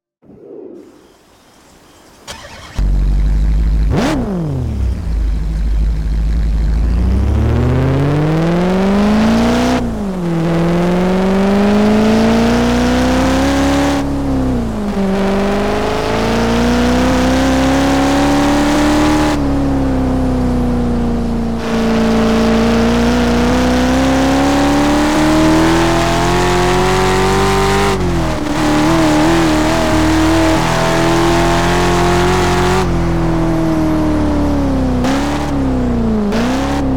chevrolet corvette c6; Chevrolet Corvette [C4 / C5]; TDU-1

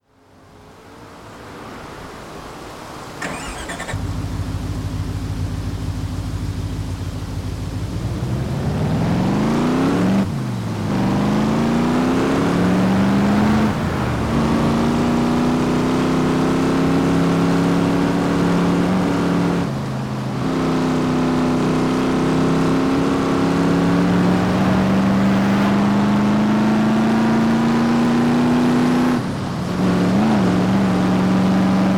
shelby gt500; 2013; TDU-1

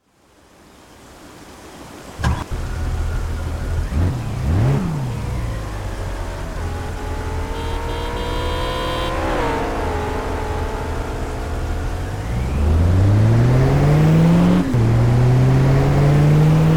audi rs4; TDU 1 - Sound mods by Djey

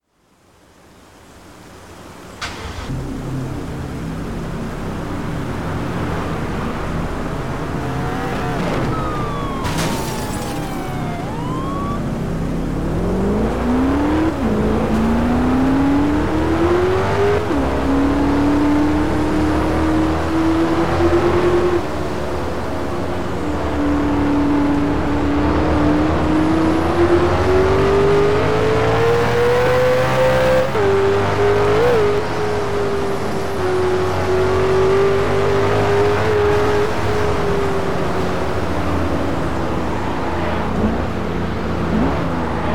bmw x6 m

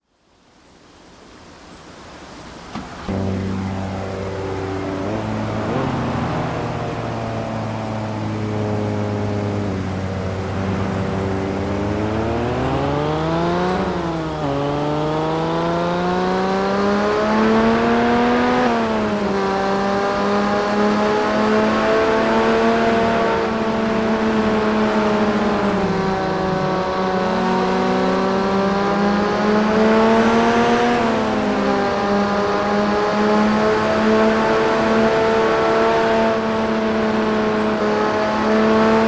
nissan skyline er34 gtr edition 2002; TDU-1

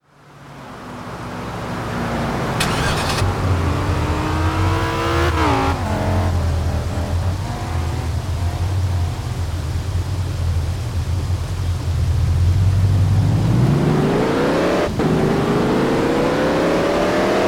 shelby series 1; 1999; TDU-1

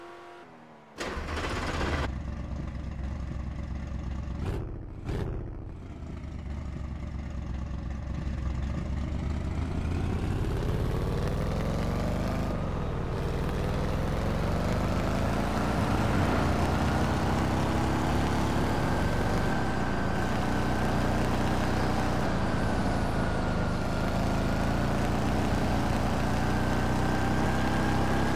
chevrolet camaro ss 396; 1969; Chevrolet Camaro Z-28; TDU

